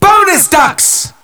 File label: ducks; ph enhanced; taunts; garrysmod